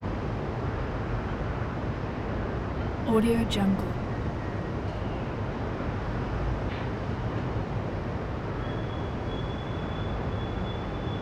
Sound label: san francisco downtown distant traffic hum